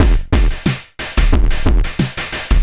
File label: Street Tip, R&B Drums